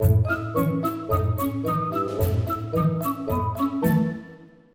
sting promise satisfy; The Sims 3; Windows

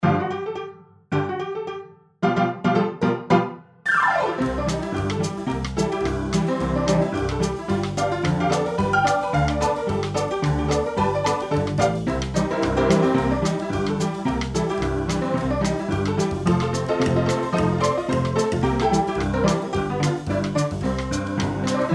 Honkeytonk Band

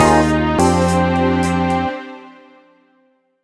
Lose; Jett Rocket II The Wrath of Taikai

